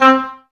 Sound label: OBOE; Woodwind Brass Ensemble; Miroslav Vitous